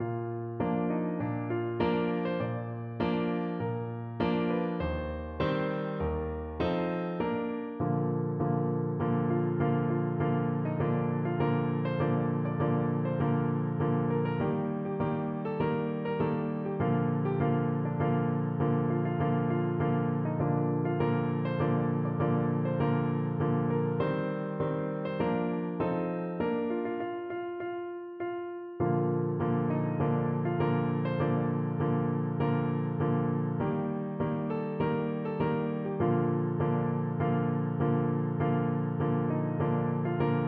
battle hymn piano

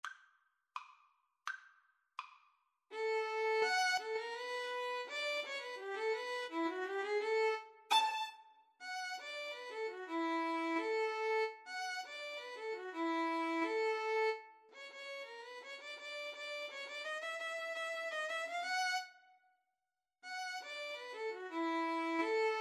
Violin Viola Duet

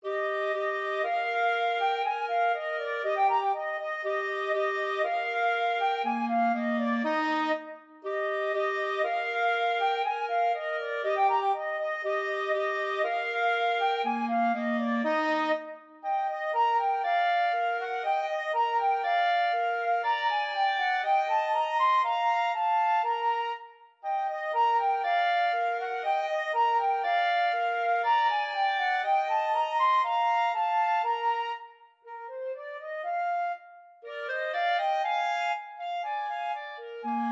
flute clarinet duet

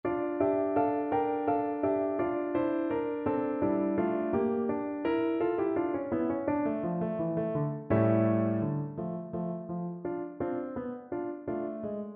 Clarinet; Libes shmertsn

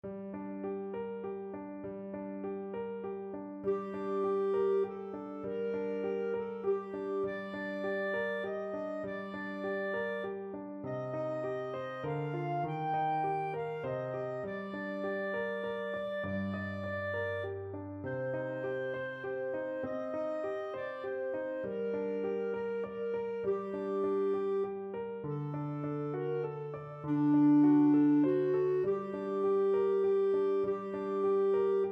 without a wind, clarinet

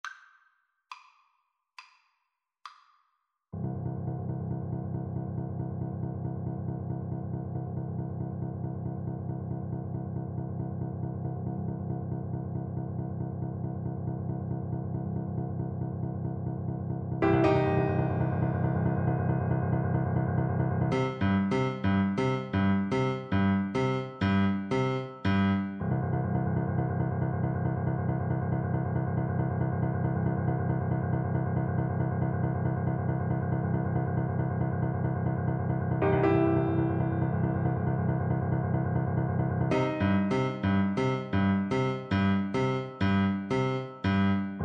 also sprach zarathustra, French Horn

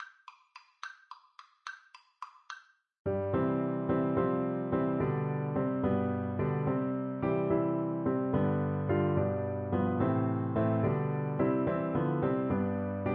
three ships, Flute

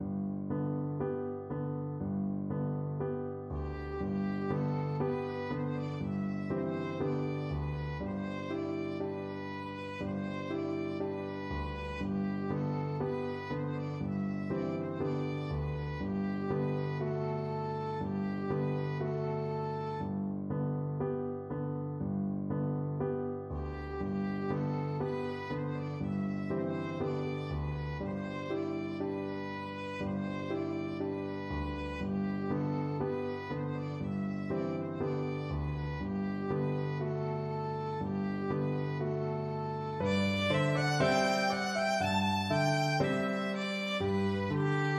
irish lullaby violin

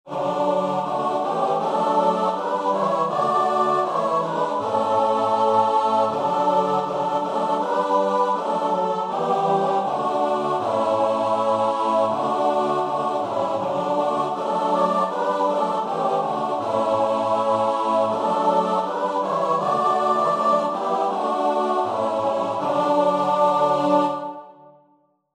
choir

